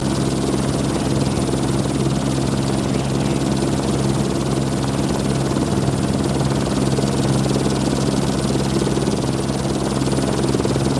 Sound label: Helicopter Idle Loop